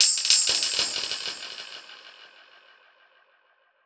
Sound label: Perc Hit, dub percussion